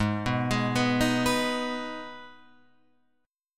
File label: G sharp Minor G sharp down Guitar Open F, chord; G#m arpeggiated; JGuitar